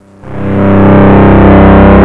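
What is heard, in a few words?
INDUSTRIAL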